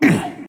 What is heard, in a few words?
Grunt Hit; Paladins; Dredge